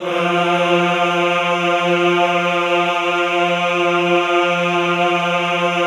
EHH F2, Classical Choir; Best Service; Extended Classical Choir; EHH MIX

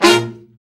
BRA SECT; BRA SECTIONS

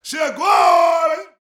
BRAZIL, World Voices

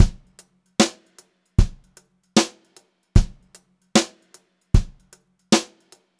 brushed drum loops, beat; USB Soundscan vol.39; AKAI; 76 HOTROD

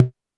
polyvox tom, Polivoks; Formanta Radio Plant

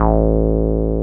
303 SQR A2 F, SQUARE BS

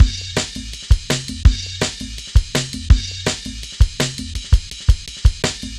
Jazz Ride A, Drum Loops, 165.5; Jungle; Zero-G Jungle Warfare 1, 2 & 3; 90 s Sample CDs

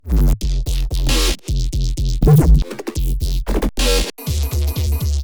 Basses

